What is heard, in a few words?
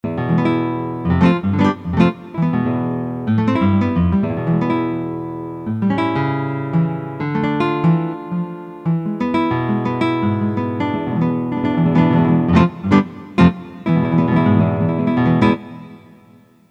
guitar, Guitars (Acoust), Korg DSS-1